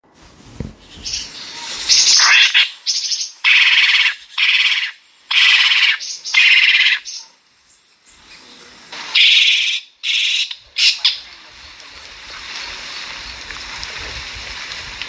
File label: Spotted owl